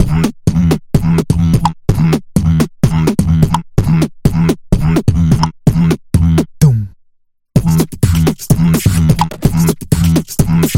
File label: Beatbox; casual game